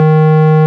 ORG, Farfisa Clarinet

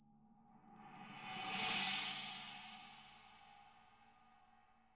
CHN SWEL, CHINA SWELL; ILIO; Double Platinum Drums 2

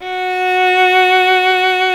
VIOLIN F#4, SOLO STRINGS; Greytsounds VOL-1

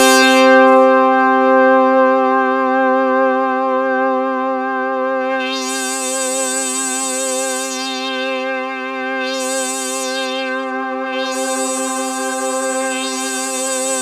Roland JV 2080, interval; MG INTERVAL

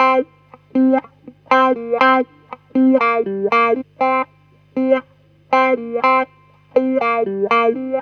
HEY GTR2; USB Soundscan vol.32; Roots Disco; AKAI

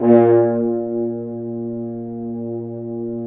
BRS, FHns Accent; Roland L-CD702; Accent-Swell

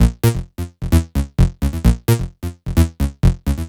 NRG Lead, trance

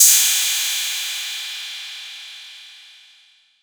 PERC 808; Wizoo - Platinum 24 Electronic; CHR PERC 808

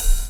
DR. LOOP; X-Static Goldmine 3

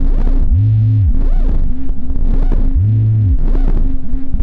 FX, Futurist Drum & Bass

